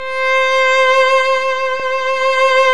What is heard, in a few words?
VIOL C4, Roland LA Composer strings; Roland - LA Composer vol. 1